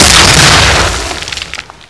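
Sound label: grenade explode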